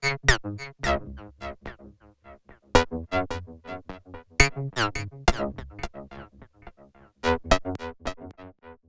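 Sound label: synth, Hip-Hop & R&B; USB Soundscan vol.47; AKAI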